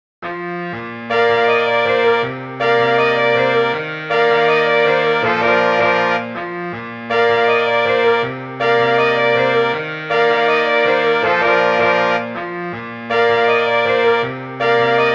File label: horns